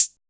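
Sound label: 808 closed hi-hat; 808DNC; Drums In Your FACE!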